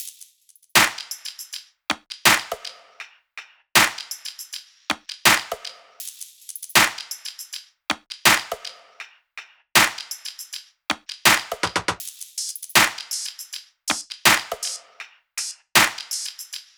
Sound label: loop top 80; Seismic Loops